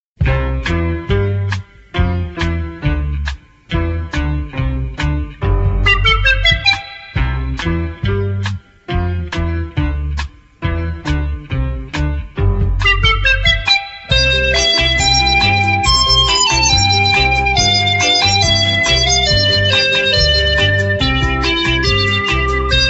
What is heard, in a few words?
Instrumental; aghany atfal